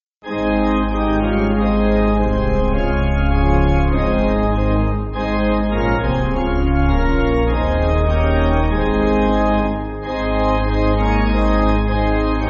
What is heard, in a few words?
Pipe Organ, Music Backing Files for Churches